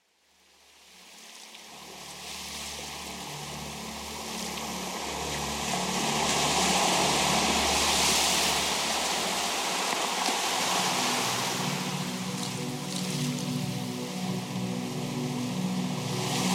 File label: tropical ocean; 9 elements